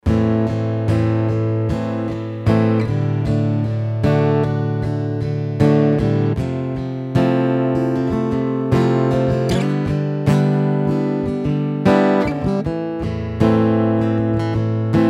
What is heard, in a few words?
Shadow JM-30E NS test strumming